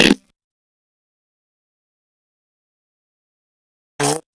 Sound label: toilet